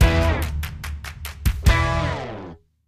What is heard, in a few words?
Victory Sting; Bizaardvark